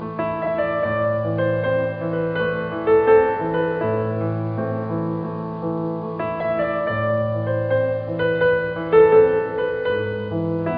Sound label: melody